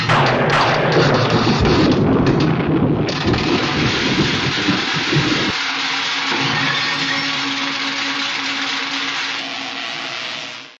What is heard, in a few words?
lab sounds